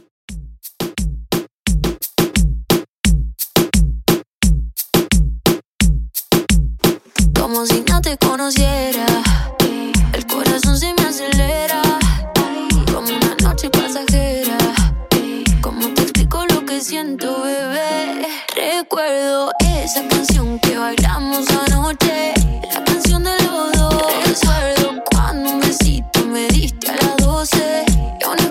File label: Reggaeton 87Bpm